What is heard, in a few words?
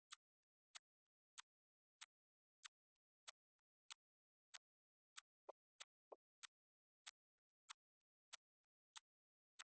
wdrums inst sim; SiSEC10; underdetermined